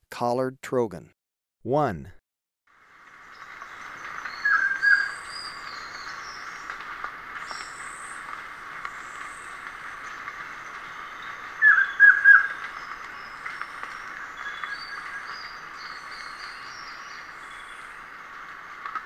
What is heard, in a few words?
Collared Trogon, Birds of Ecuador; S. America